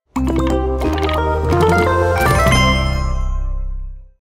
logo sound; mojang

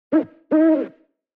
owl; mmp

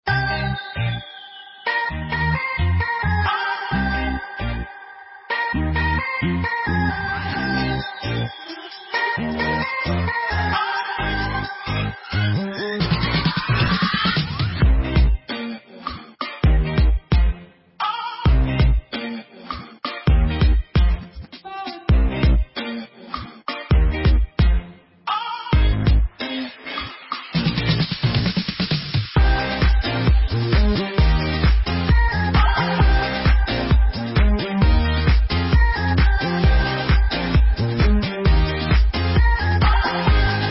fancy bg music

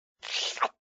lick sound; regretevator

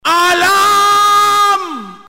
alarm ultrageil

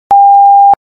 signal 100 tone dispatch

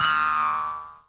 flat boing

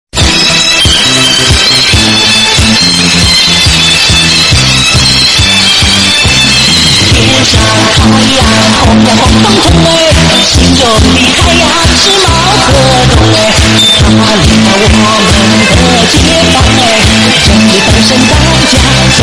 social credit music loud

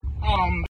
glitch

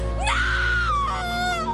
screams nooooooo

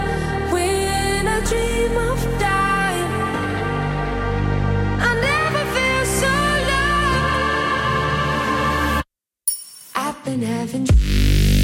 trampoline remix

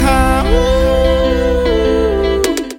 aoww

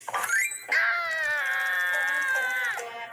raccoon aaaaaaah

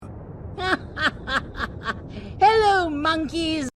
ha ha ha; hello monkeys

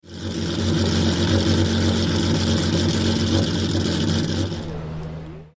engines on panel

